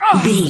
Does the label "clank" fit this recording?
No